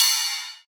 Ride; Raptor; Cymatics; Dubstep Starter Pack; Drums - One Shots; Cymbals

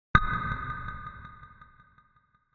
Tone; Tones

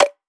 Percussion, Hip Hop, Drum One Shots; Cymatics; Cobra Hip Hop Sample Pack

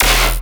Bass Hit E, Dubstep; Glare Bass; Ghosthack; AC2024 - Day 13 - Dubstep Sounds 2025

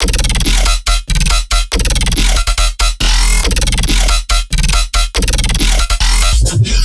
Bass Loop 140 E; Trap and Dubstep; Junction; Ghosthack; Free Trap and Dubstep Samples 2018

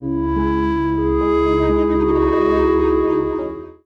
PHRASE Square - 124 BPM C# Min, Ear Candy; Cymatics; ANALOG EVOLUTION Beta

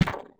Alien Weapon Shot; Game Essentials; Sound FX Military

